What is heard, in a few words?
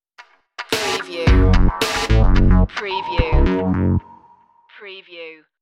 80s cop funk stinger